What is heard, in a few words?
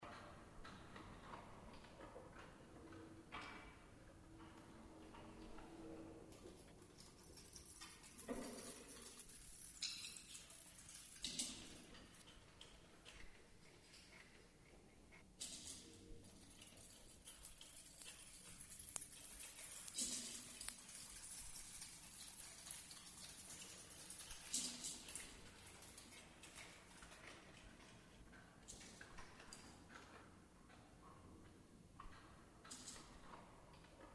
singer